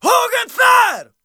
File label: Battle Cry, Viking Vocal, Un-Processed